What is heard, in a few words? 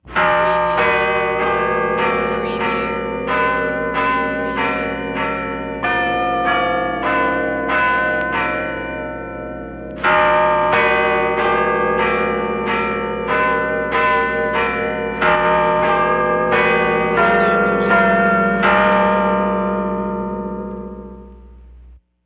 Bells Church Christmas Antique